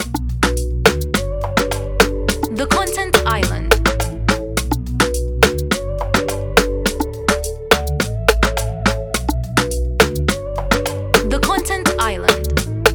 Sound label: Jungle Groove